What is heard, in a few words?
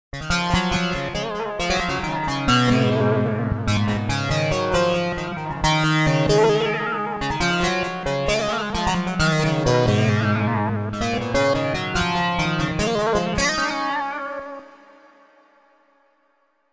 Glass GTR, v-synth